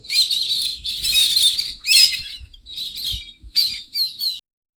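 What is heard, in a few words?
gusty wind with fluttering, chirps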